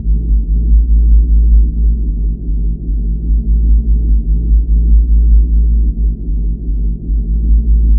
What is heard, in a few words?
Drone Ambient; Drums & Drones